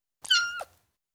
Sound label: Ten Week Old Kitten, Individual M, Cats; BBC Sound Effects Library